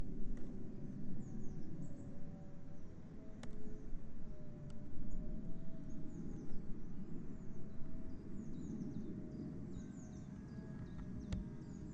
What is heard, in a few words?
three quarter strike and lots of birdsong plus an aeroplane and some sort of machin; machinery